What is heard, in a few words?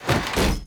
clip trap